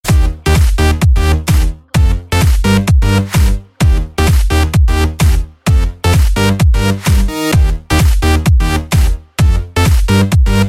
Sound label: remix